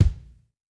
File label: kick, house